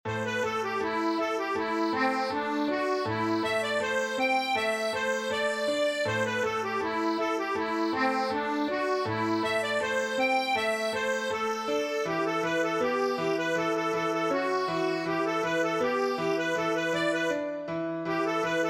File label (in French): deuxièmes voix ACCORDEONS